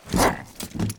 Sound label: WAR DAMAGE; HUMBABA; Mob